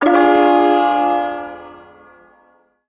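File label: Shawzin Minor Chord; Min Chord B; Warframe; Duviri Ersatz Shawzin; Chords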